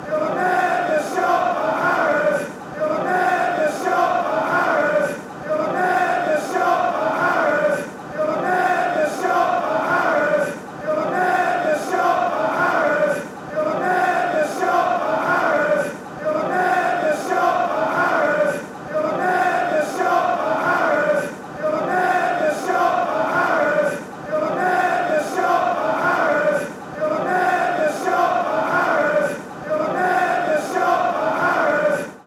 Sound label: never shop at, chants; harrods